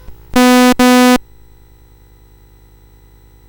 BASIC sid CSG8580R5

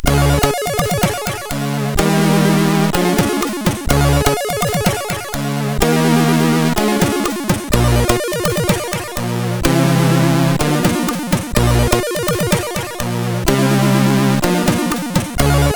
ingame sid CSG8580R5; Flummis World

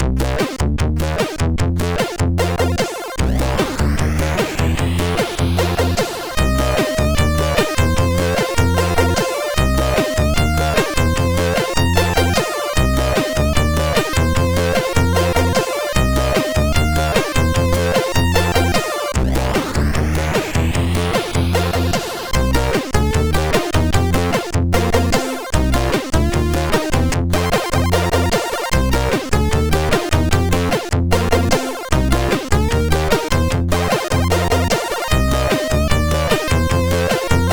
Intro Song, MOS6581R4; Intro Song v2; hvsc

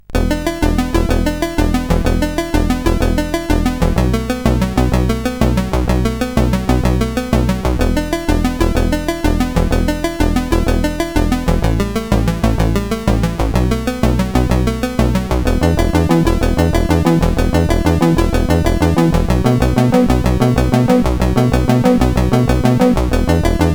Any One Man, CSG8580R5; sid

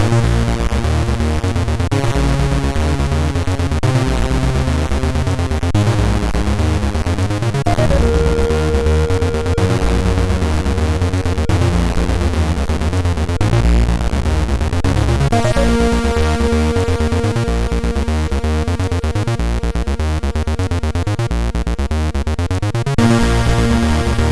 Loader, sid MOS6581R4; Uridium 2

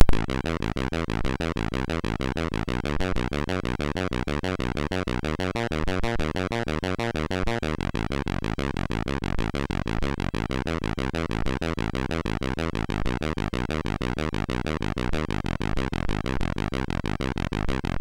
sid MOS6581R3; Boom game

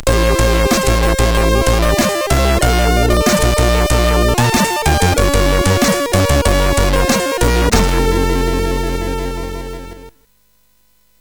sid CSG8580R5; Zamzara; hvsc

